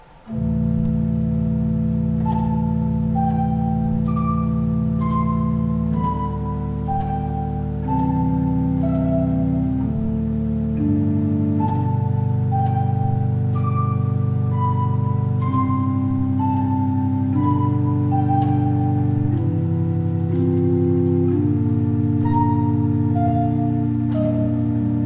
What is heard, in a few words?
Ott